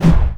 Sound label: death common flyers fall